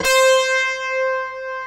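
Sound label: Strings Guitar; Optical Media International - Sonic Images Library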